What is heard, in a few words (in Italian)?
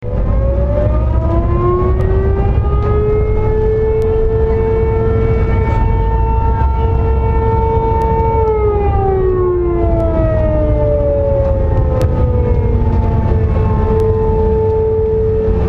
sirene